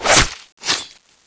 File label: knife stab